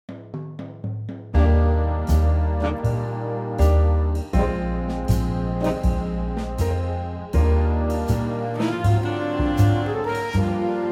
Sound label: Big Band Eb